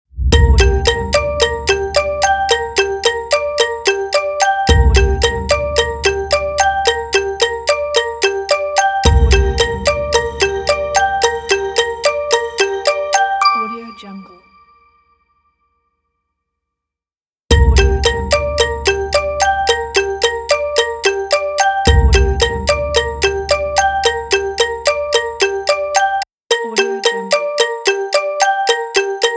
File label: mysterious countdown